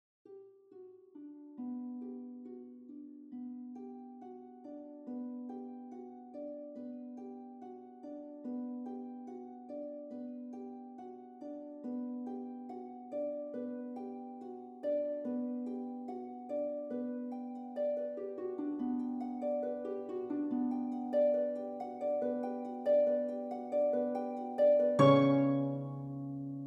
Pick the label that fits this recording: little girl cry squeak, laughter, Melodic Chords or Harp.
Harp